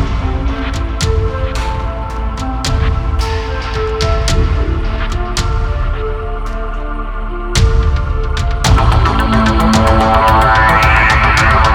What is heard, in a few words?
80s Synthesizer Soundtrack